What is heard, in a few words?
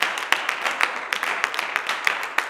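CLAPS 96, Gospel; Blues & Soul; G.CLAPS 96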